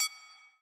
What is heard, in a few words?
big Win Count Up End; jack and beanstalk